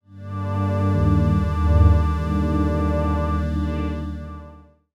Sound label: C Synths, One Shots; Keys & Synths; Touch Loops; BlissedOutBeats